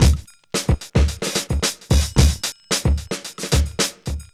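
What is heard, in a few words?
111 bpm, Funky loops; Zero-G; Funky Elements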